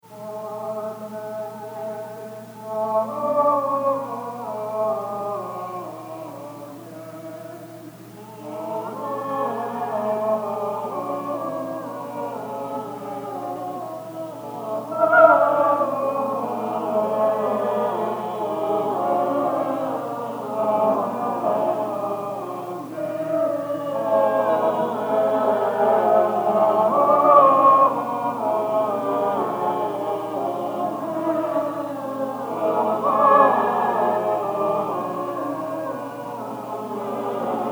amen round

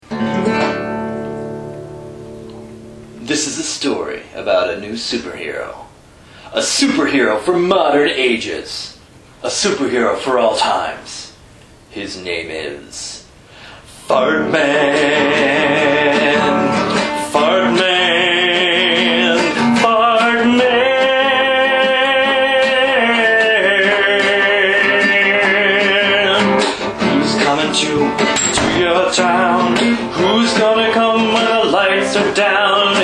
fart man

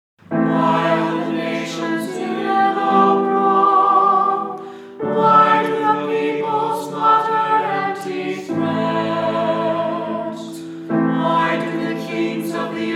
a responsorial setting hahahahaha

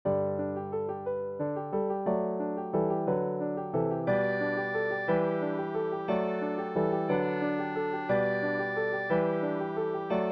bells are ringing

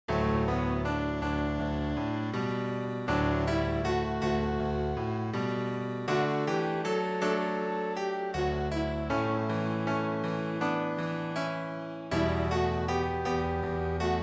piano

